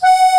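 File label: accordion F#3; Best Service; Ethnic Journey Sound Cube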